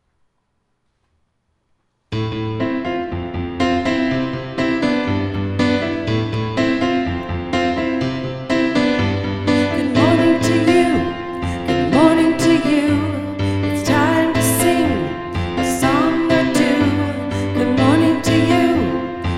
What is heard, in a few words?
Good Morning Vocal